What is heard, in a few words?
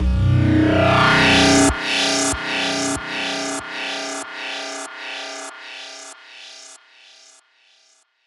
Sniffles B 142 BPM, Psytrance Zaps & Filter Sweeps; Ghosthack; Psytrance Visions Volume 2